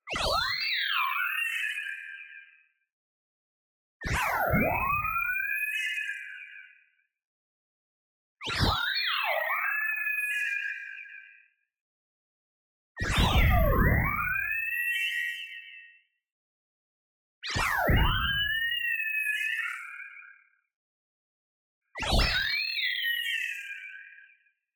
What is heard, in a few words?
tonal, friendly android; boom library; Anime Essentials